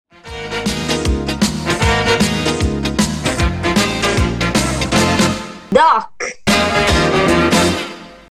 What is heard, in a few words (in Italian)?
Sigla FINE